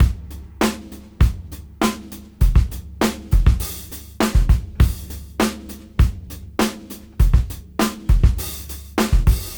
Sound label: Old School 101 bpm; AMG - Keith LeBlanc Phat Butt Jams